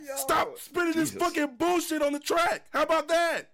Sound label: rage